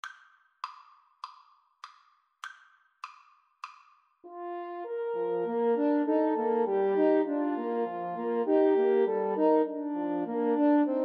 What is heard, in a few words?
blue bells scotland, French Horn Trio